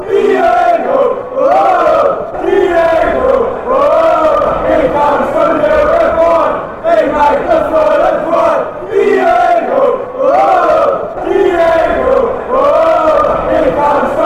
diego whoa, fanchants

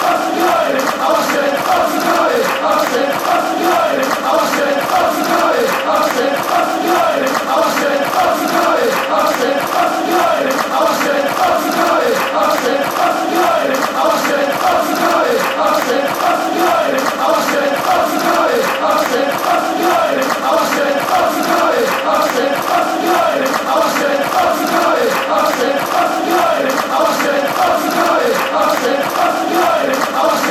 oxford united are sit, fanchants

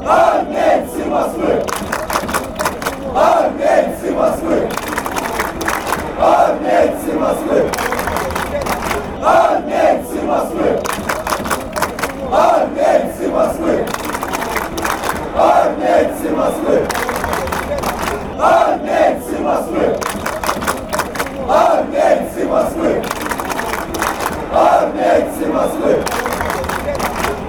cska chant

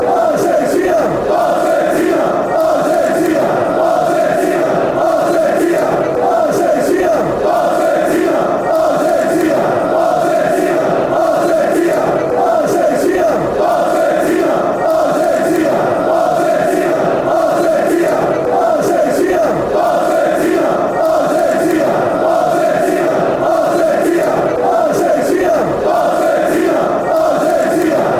argentina, fanchants

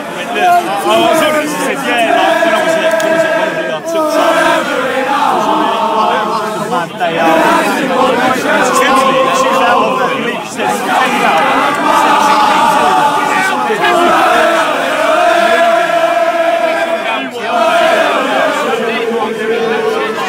allez allez allez, fanchants; at tollgate

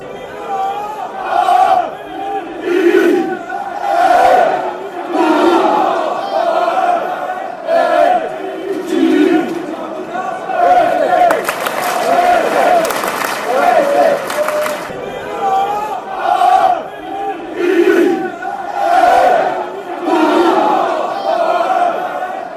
reding, fanchants

